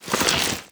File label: close, zombie blind, foley; creatures